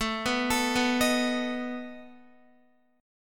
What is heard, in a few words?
A Suspended 2nd Flat 5th, Guitar; Asus2b5; arpeggiated; x,12,11,14,11,11; 4F,4A,5C,5G,5C,6E; JGuitar